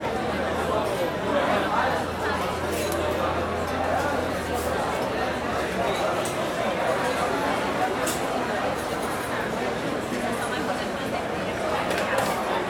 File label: Restaurant Atmosphere Crowded